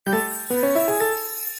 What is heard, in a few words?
Win Symbol Wild; slots; Chic Town